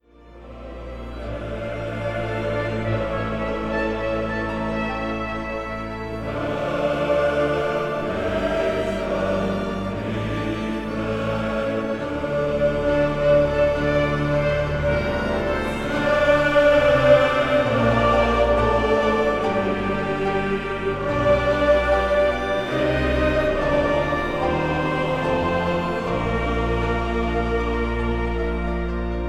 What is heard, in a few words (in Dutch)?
Psalm 72 vers 2 en 11, mannenkoor